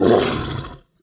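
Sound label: flame damage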